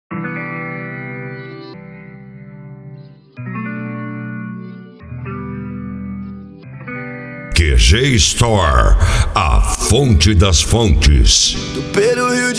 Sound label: trap